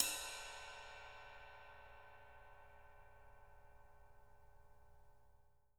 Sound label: rides